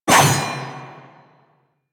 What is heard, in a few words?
Sword Whoosh; Warframe; Teshin; Weapons